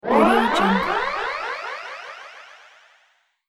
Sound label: games rising jump